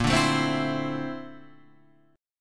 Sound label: A sharp Major Sharp 11th, down, Guitar, Open F; strummed; chord; JGuitar